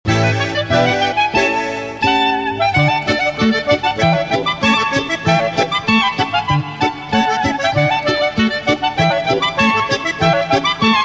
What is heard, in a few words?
Tiroler Musik ringtone